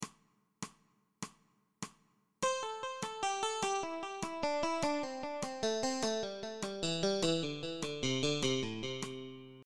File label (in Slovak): osminove trioly nadol priklepy uvolnenie; bluesova pentatonika cvicenie